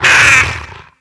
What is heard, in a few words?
gynosphinx attack; DAoC